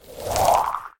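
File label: feather creation; jewelpetsmatch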